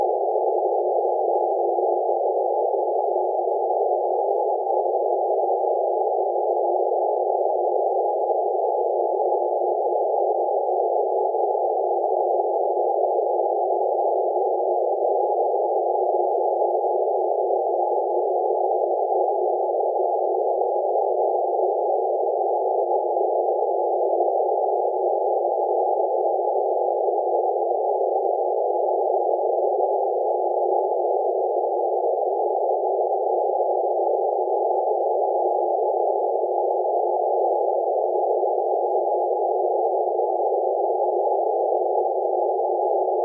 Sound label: noise